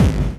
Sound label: HardCore Kick